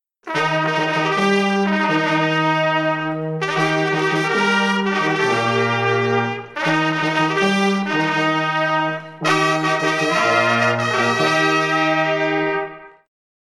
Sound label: Fanfare